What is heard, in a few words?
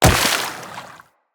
Wall Jump Water, Footsteps; Wall Run; Characters; Tenno